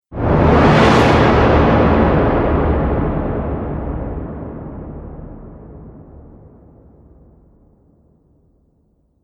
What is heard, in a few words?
Trailer Hit Thunder Strike; Trailer Sound Effects